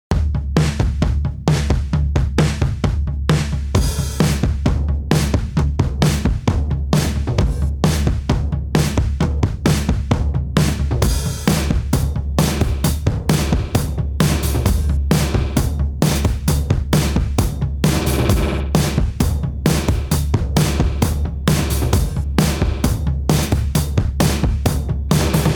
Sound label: Drum Loop